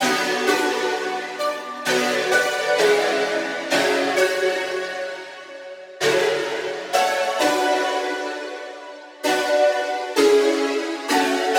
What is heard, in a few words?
Crisp Synth, F-, 130